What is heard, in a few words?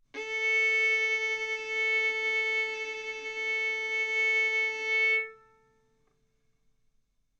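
Violin arco mf A string A4 B4 stereo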